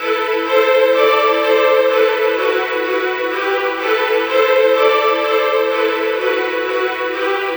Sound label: Strings